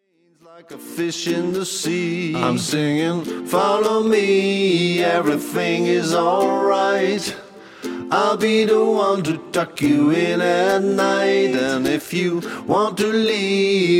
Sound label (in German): ukulele gesang